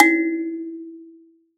Finger, Prepared Kit; Battery 3 Sample Kits

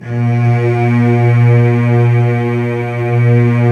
STR LUSH S, MIDI Section

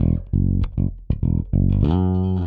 Rock, Bass; Bass X

